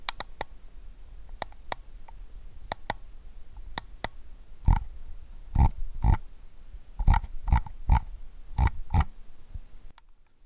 shelchki i povorot kolesa prokrutki razer orochi v2

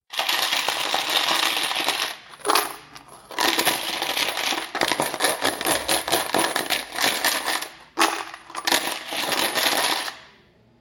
ochen silno tryasut kopilku

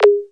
knopka suhaya odinochnii zvonkii rezkii

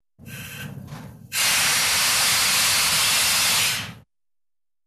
lokomotiv poezda vipuskaet struyu para gaza

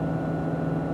neprodolzhitelnij shum rabotayushego dvigatelya

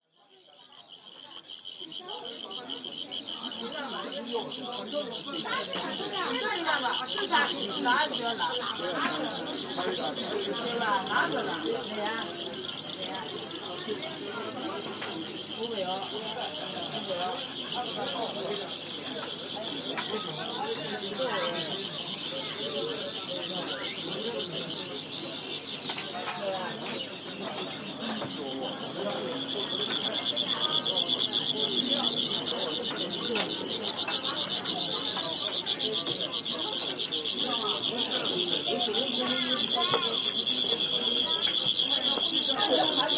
strekotanie saranchi i razgovori lyudej

